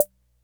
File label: KORG SR120; Drums